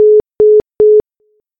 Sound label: Busy Signal Europe